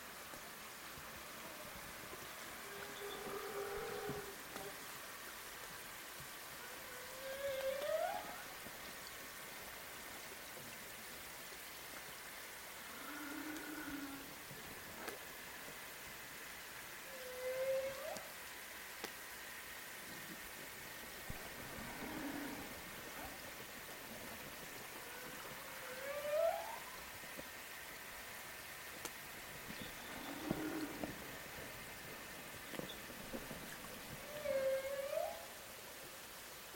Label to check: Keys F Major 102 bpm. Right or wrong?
Wrong